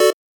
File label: Chord